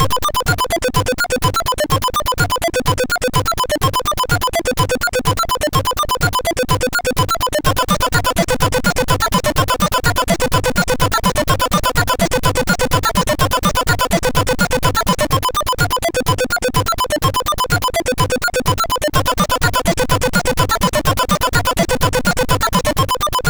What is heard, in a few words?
Techbeat, MOS6581R3; Spang Jesper